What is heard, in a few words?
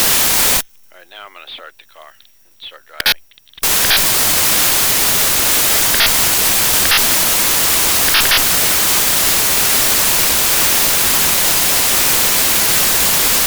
tpms from start, Mazda